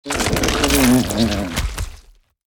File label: Wale Death